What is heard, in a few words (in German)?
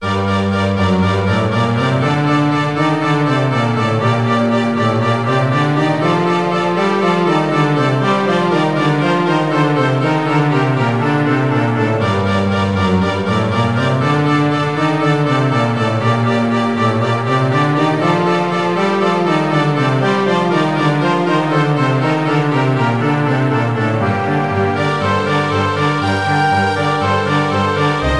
spielmusik orchesterpartitur